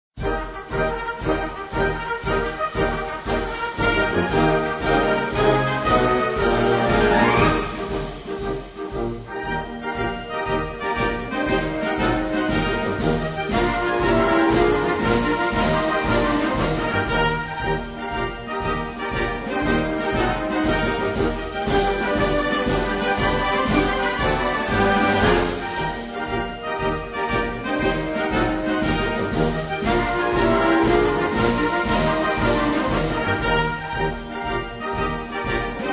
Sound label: Swedish Cavalry March